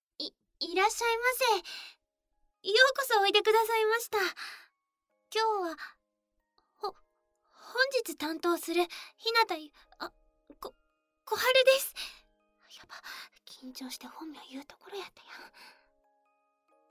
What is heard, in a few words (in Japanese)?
お耳かきかき オルゴールver; 小春の初施術; ダメダメたいばい…